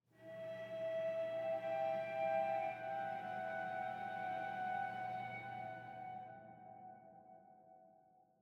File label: Airy Strings and Bass; Airy Strings and Bass Mood